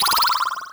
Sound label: sfx sounds powerup; lows adventures